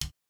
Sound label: hihat, house